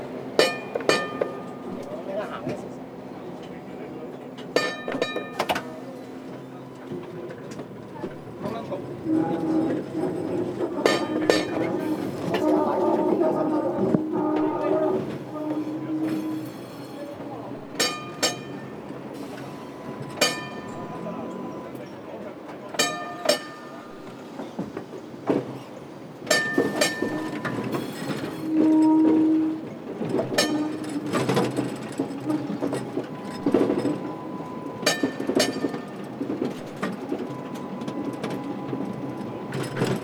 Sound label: HK Tram Ding Ding; HK Amb